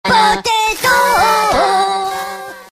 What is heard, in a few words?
potatoe notification